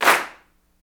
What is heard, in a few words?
CLAPS, Africa Handclaps